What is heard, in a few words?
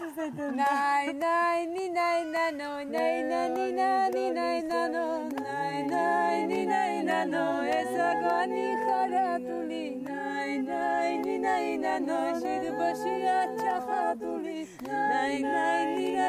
Lullaby Song, Svaneti songs; Pilpani